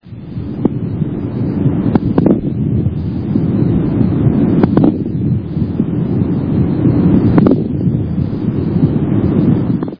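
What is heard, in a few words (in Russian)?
дыхание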